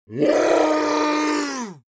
yeti attack shout aggressive command; schreie

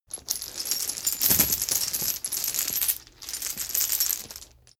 metal chain pull up

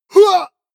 male combat attack short

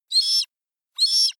hawk young chirp; natur tiere; voegel

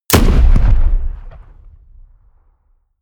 shot

